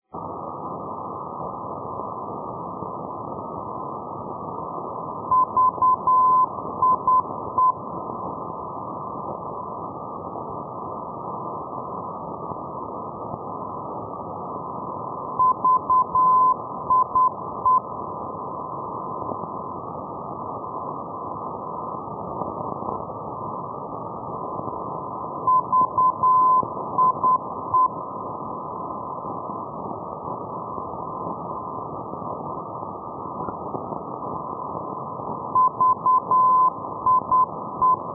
ndb, ndb; vieste; ndb nis jst-405

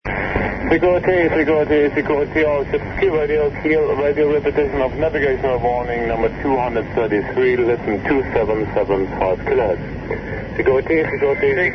kiel radio 2182 khz; europe

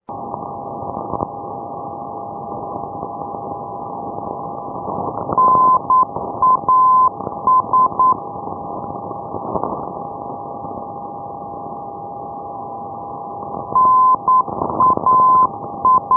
ndb punta arenas; chimpay, rio negro, arg

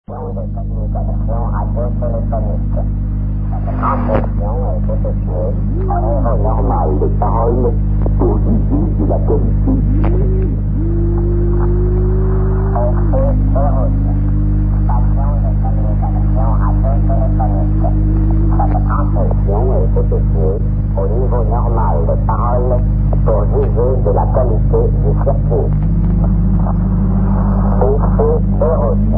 societe radio orient beyrouth usb

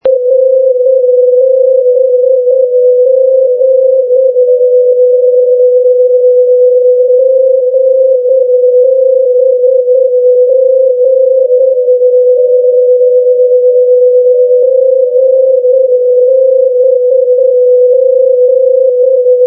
ndb bad gleichenberg

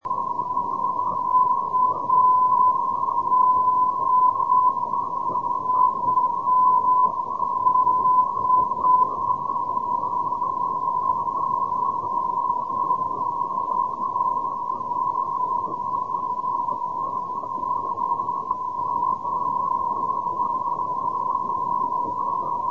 ndb buturlino

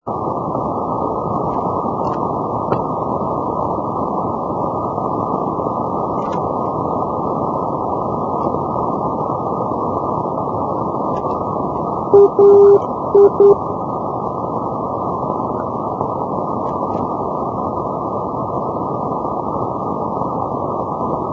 ndb alicante; europe

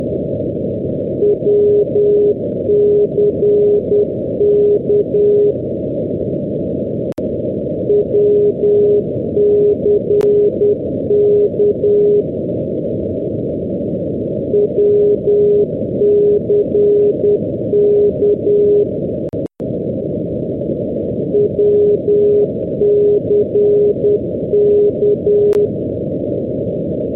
ndb wick; shetlands; europe

